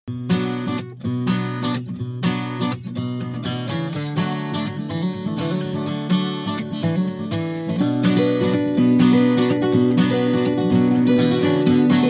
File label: Folk Full Thoughtful Intense